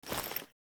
Female Foley Action Default Melee Swipe, Default Clothing; Characters; Player